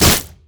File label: thorn plant; Zyra; LoL